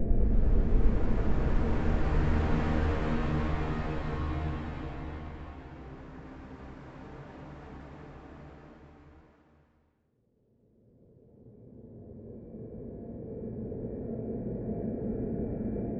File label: Danger Tonal; Insanely Twisted Shadow Planet